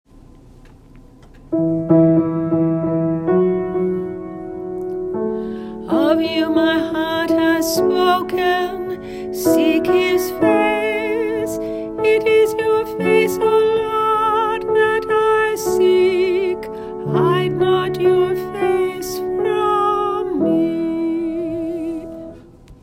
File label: Entrance Antiphon; 2nd Sunday Lent